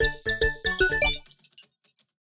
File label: ringtone